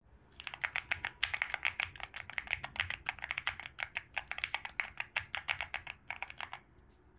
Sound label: epomaker ek21 x